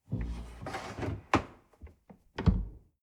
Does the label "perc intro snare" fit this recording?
No